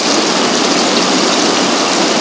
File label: BURNING